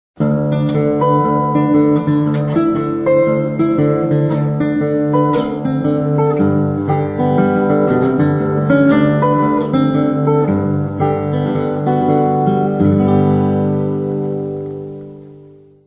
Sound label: yamaha, guitar